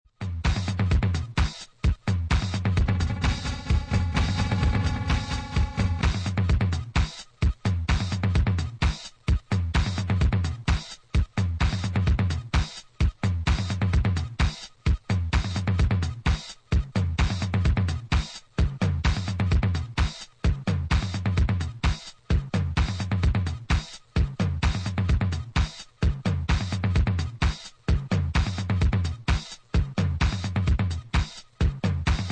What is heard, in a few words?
drums